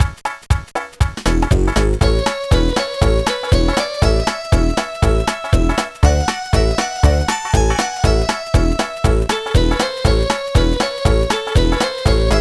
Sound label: Level Finished; maya the bee the great adventure gba gamerip 2002